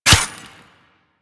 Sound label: Melee Dagger Impact Robotic Flesh; Warframe; Weapons; Daggers